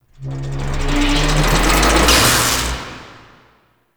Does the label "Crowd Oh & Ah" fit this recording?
No